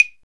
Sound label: PRC CLAVE, AFRICAN PERC; Roland LA